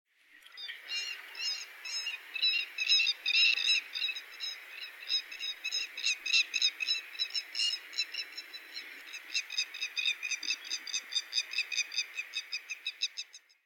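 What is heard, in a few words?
Grey-headed Lapwing, fuji; keri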